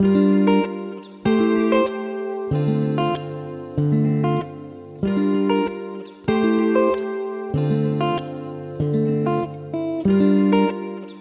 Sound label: 191bpm D min